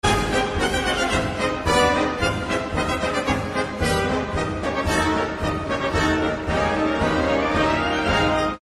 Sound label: clown song